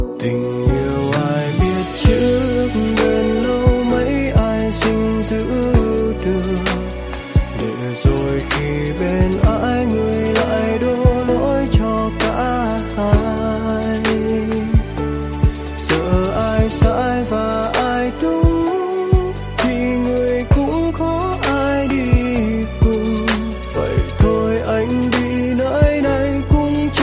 Lofi

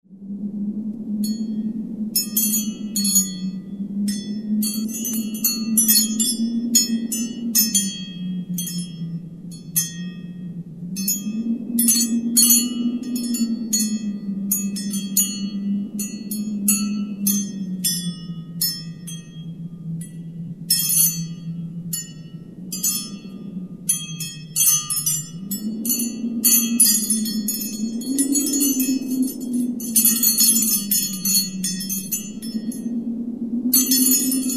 glass tinkler; winter

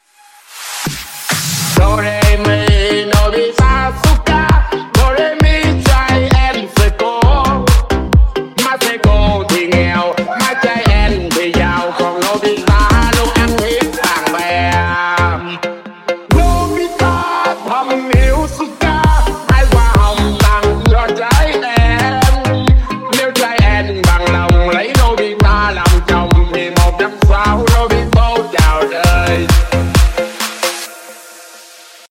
Remix Tiktok

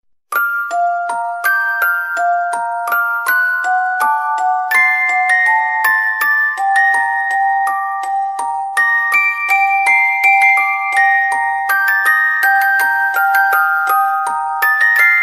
htc sms

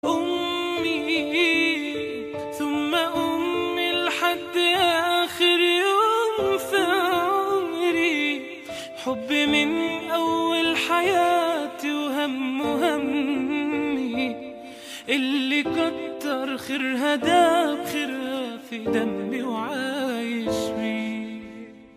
arabic

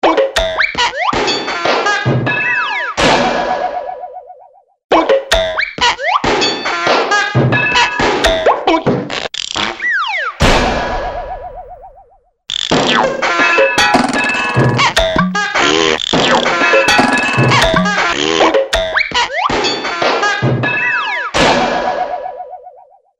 cartoon sound

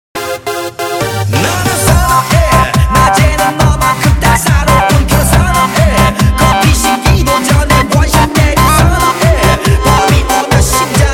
remix

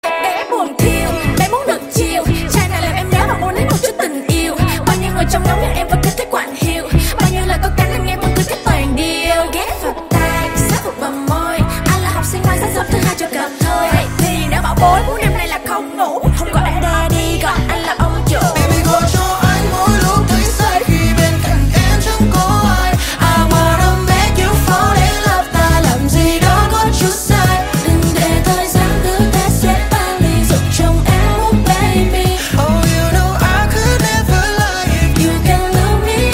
chill TikTok

